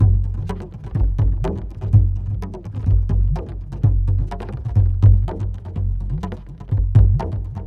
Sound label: PERC LOOP ETHNO 125; eJay